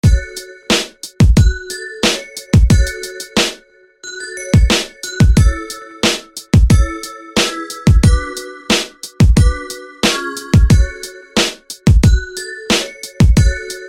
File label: Xylo flare